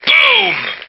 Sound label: boom; svencoop